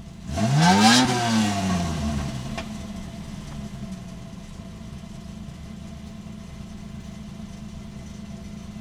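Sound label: idle, car; trasporti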